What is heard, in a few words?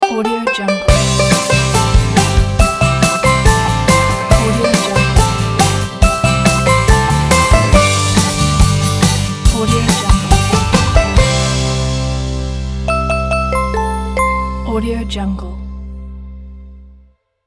positive mood ident